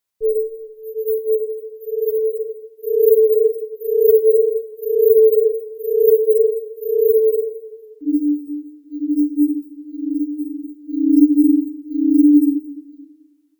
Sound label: warped space flute